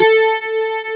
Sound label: digi decay